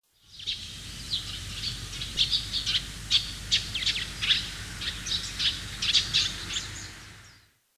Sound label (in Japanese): suzume, kokubunji